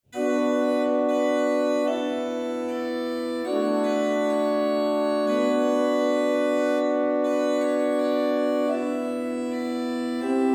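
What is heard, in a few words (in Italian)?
corali, organist; 5) Nun komm, der Heiden Heiland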